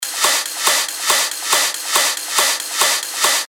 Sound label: techno loop 140 BPM; Caramba; revealed techno top loops vol 8